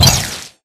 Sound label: imp bullet lightballistic shieldwall; Titanfall 2